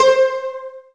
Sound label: SYN, Roland, digital